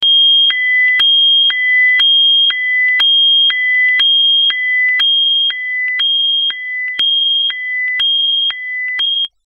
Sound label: reaching alarm, countdown